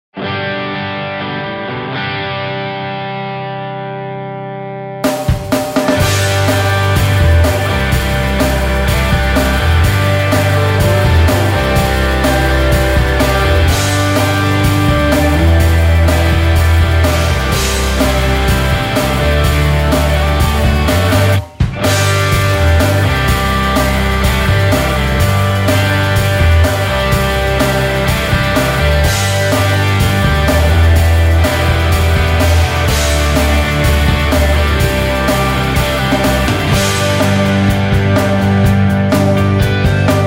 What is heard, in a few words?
Country